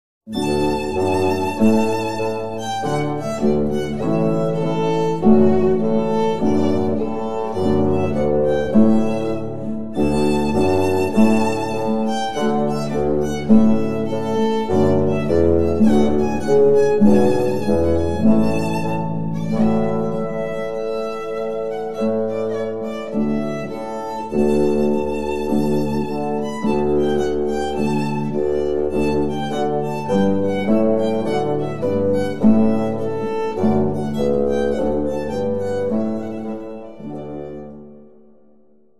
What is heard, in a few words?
Trumpet Tune